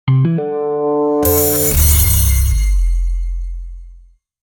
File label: jazzy reveal sound